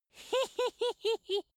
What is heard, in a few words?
dwarf laugh sneaky soft